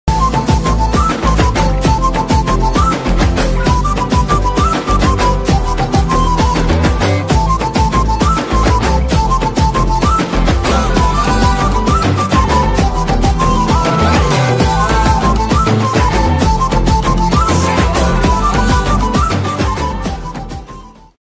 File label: bgm mix ringtone, song ringtone, telugu; ori devuda